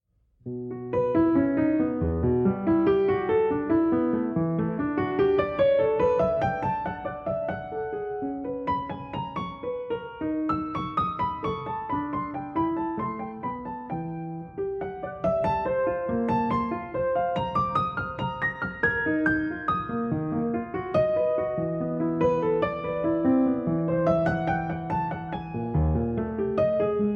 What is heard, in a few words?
Improvisation